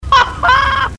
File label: laugh